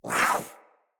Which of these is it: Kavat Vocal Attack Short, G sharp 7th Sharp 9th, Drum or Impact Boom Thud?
Kavat Vocal Attack Short